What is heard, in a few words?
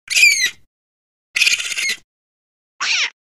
echidna, zvuk ehidny